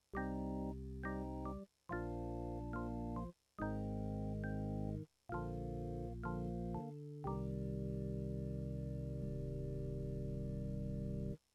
Organ; Boehm

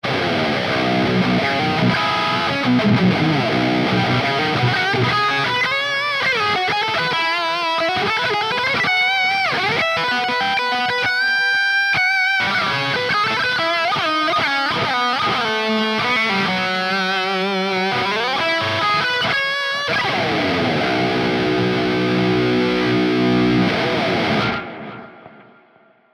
LTD Baritone Arctic Metal Lead w effects

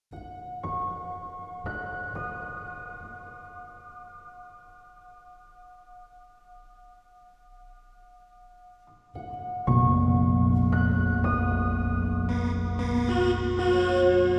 Ambient

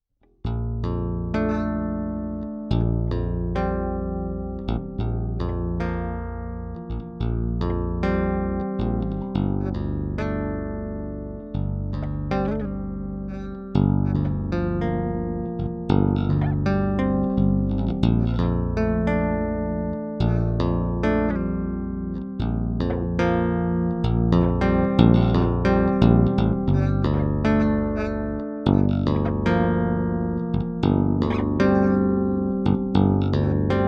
Savannah Asat Bass Tapping

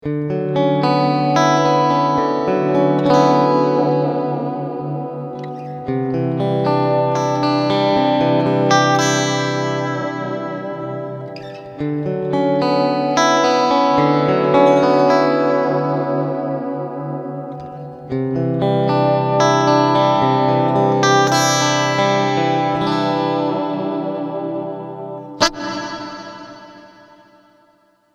bright hall

